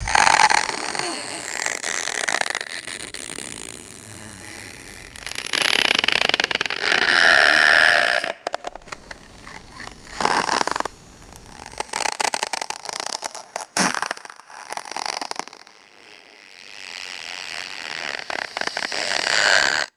squeaky pressurized ice creaking

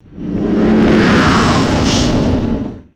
thick airy gritty whoosh burst

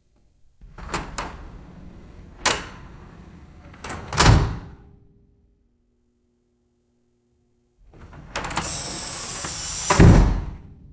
heavy metal door opens and slams shut; doors

